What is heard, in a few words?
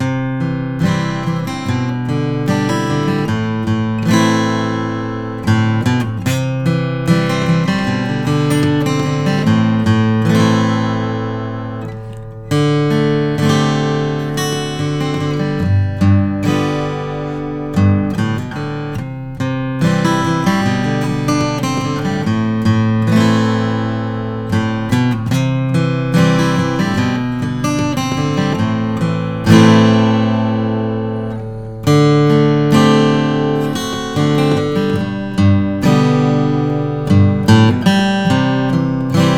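taylor 914 ce ltd brazilian natural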